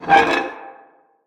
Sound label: Water Valve Turn